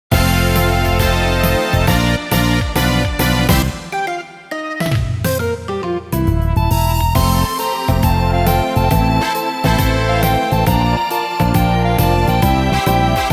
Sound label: Success Introduction BGM